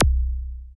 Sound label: FX Analog; Sound & Vision - Gigapack II